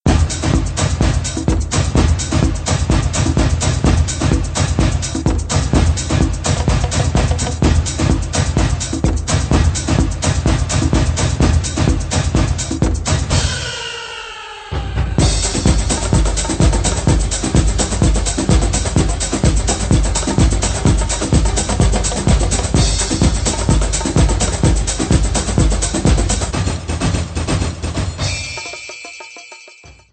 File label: Brazil Brazilian, zumba